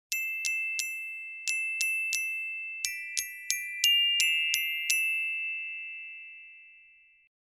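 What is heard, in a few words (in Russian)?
оркестровый колокольчик с музыкальной темой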